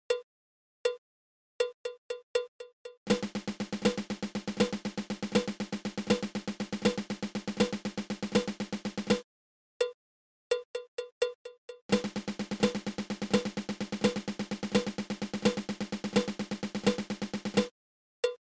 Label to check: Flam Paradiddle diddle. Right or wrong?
Right